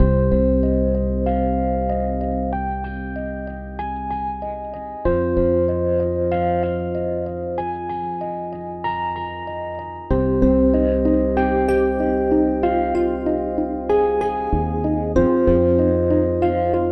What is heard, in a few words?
String Forest; Xenoverse Per Aspera Ad Astra